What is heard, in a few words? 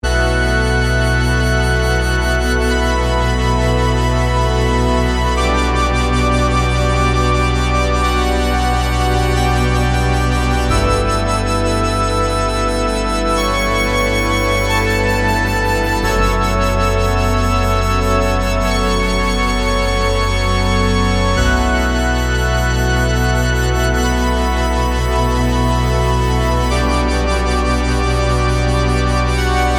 bass organ